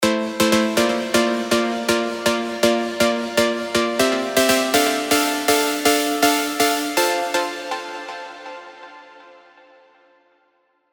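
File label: pluck, Hyper Beam, spire; alonso fireworks stand 1776 edition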